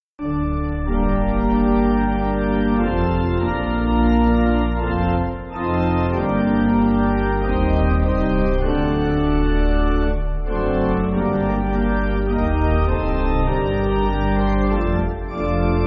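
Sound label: Pipe, Pipe Organ; For Ages Women; Vox Dilecti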